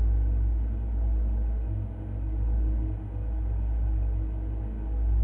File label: roomtone caves